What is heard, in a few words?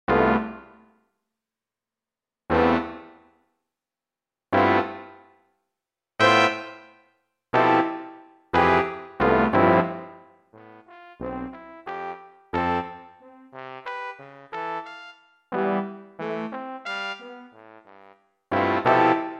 BRASS